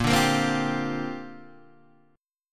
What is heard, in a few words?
Bb 6th Add 9th Bb Guitar DADGAD